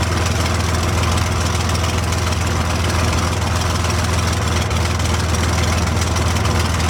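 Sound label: Plane P40 Interior Idle Loop; Airplanes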